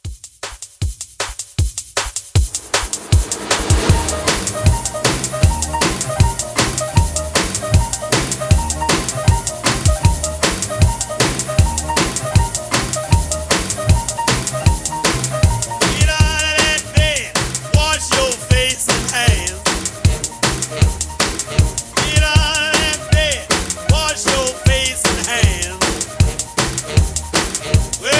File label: 50s Blues Re Drum 156